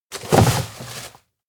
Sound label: counter box place; anemoi